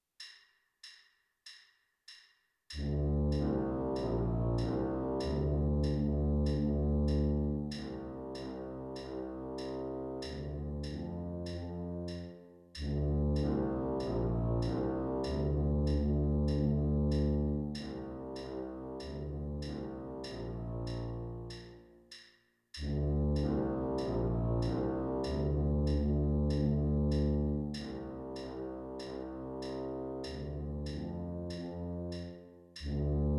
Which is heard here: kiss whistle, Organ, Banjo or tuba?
tuba